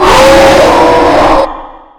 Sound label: screamer; funland